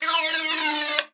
TROETE, percussion; Session Instruments; Best Service ProSamples vol.42

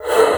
LUFT, bassoon; Xsample VOL-6

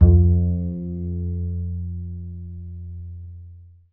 KONTRABASS; Alpine Volksmusik

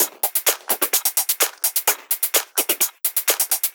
Drum Loop 128BPM FULL ohne BD

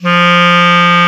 Klarinett; Alpine Volksmusik

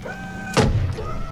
ROBOTE; Best Service; Advanced Mega Effects

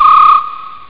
trillerpfeife; ST-XX Amiga Soundtracker Sample Packs

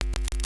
KNISTER; Xsample VOL-9; NOISE

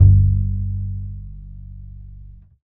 KONTRABASS G; Alpine Volksmusik

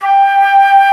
FLOTE, Alpine Volksmusik; Best Service

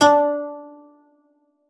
LAUTE D4; Xsample VOL-8; LAUTE+FLG

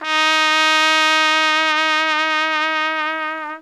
TRP WEICH, Alpine Volksmusik; Best Service

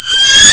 low adler; Amiga Soundtracker Sample Packs

